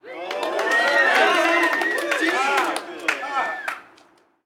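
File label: Street Ball Crowd Cheer Medium; Crowds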